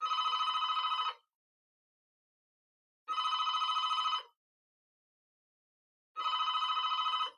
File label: Phone Cordless Ring Roomy Clean